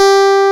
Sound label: CHROMA G3; E-MU Producer Series Vol. 2 – More Studio Essentials